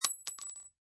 Bullet Shell Drop Rifle Cement, empty Rifle shell falls on cement